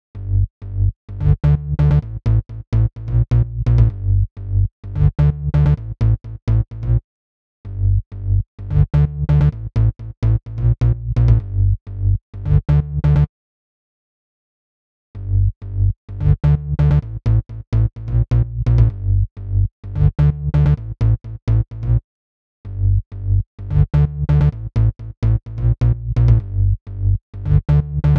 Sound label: 128 BPM Bass SC, G; Vengeance; Essential Clubsounds Vol.5; Demo Constr Kits; Slide Kit; Bassline